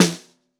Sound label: Bottom, Snare